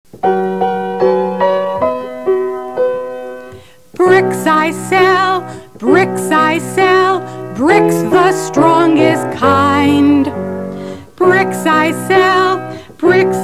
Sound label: Brick Peddlers Song Vocal